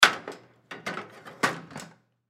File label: Chair Metal Folding, Chair Metal Folding Fold; Grab From Rack; Blastwave